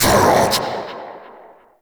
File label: Cast Vocal; Warframe; Uriel; Brimstone